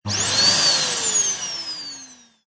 household vacuum on off very short burst false start